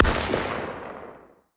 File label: combine mg distant; weapons; Half Life Alyx